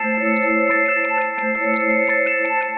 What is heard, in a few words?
Mystery loop, Synth Sequences